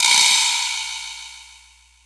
perc, Percussion; Trance Explosion Vol1

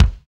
BD, Roland; Sound & Vision - Gigapack I

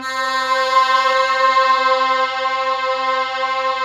Microwave; Optical Media International; Sonic Images Library; Thin Micro

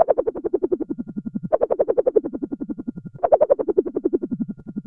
RUSTLINGS; Zero-G Ambient 1; PULSATIONS

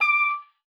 OBOE2, D6; Xsample VOL-6

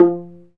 STR VLA PI, Chamber Pizz; Roland L-CD702

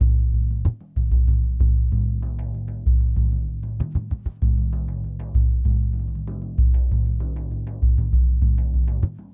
Lg Frame, World Percussion

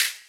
Hhat, Hihat; Equipped Music; Loungin House

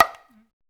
AFRO, African Perc; Spectrasonics; Supreme Beats; African Contemporary; SB Afro DRMs

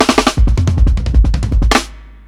105 TRIPLET FILL, DRUMS LOOPS; BASEMENT DRUMS VOL 2

